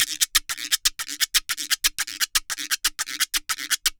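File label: GIRO SALSA, salsa drums and percussion; Best Service ProSamples vol.14; AKAI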